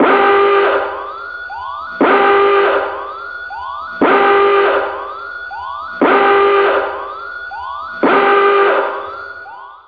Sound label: door